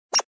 Mouse Roll; gasmania

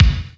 BASS DRM; CLARITY

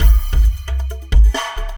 beat loop; DirectX SDK; DMusProd

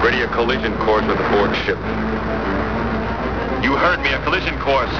collide

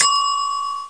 search success

